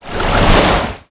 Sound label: Water